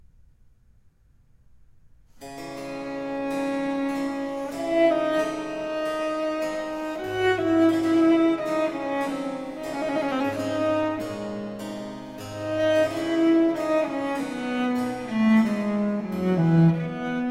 Sonata for cello and continuo in D minor Larghetto; Handel